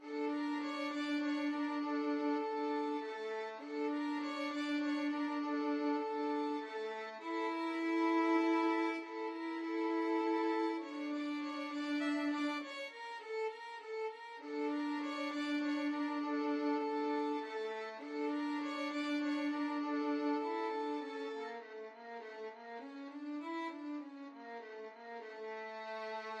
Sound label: charming snake violin duet